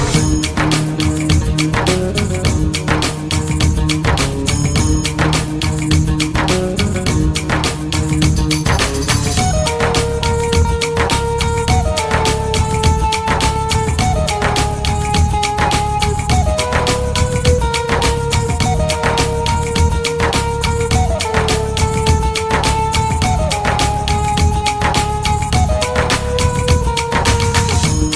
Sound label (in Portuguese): Instrumental